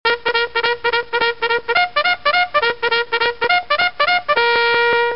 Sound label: bugle